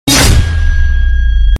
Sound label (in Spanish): Efecto de sonido espada chocando